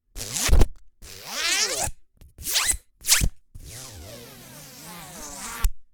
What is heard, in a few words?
Ribbon Zips